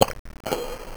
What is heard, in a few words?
soda; scavengers; UnityRoguelike